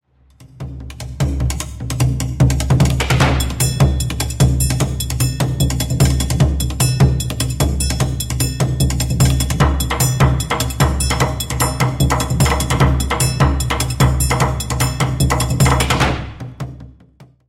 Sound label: aux riverb